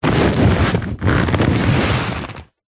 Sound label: household chair sofa couch leather body sit down slump relax; zapsplat